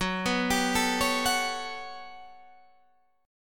G Major Sharp 11th Gb, chord

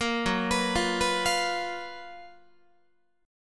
F sharp Major 11th Guitar; F#M11 Chord; arpeggiated; 13,14,14,15,15,16

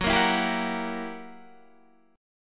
Gb 7th Flat 9th Gb Guitar Open D

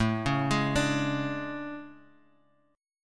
A Major 11th

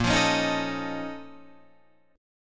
A sharp Minor Major 11th, Guitar, Drop D

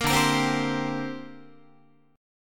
D 7th Suspended 4th Sharp 5th down Guitar; D7sus4#5 strummed

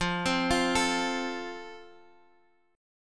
E sharp Major E sharp, chord; E# arpeggiated; JGuitar

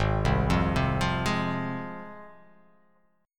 G sharp 6th G sharp down, chord

